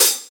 raw pedal hi hat sample g key, Royality free hi hat one shot tuned to the G note.